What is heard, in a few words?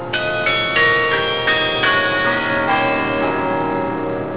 carillons; marquette